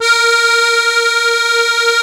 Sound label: ZUPAN ZUG, AKKORDEON; Best Service; Alpine Volksmusik